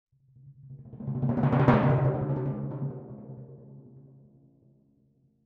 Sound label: Crescendo-decrescendo D, Timpani; PowerFX; Downtown Orchestra